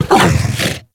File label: Tame Interact Stand Scratch Bark; Enemies; Warframe